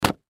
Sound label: Cardboard Box Knife Stab Hard; Foley; Materials; Blastwave